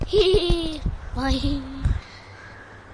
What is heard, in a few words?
laughing, Children; vocals and spoken word